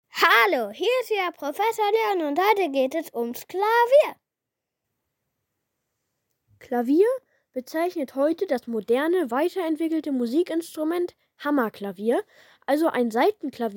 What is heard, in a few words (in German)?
klavier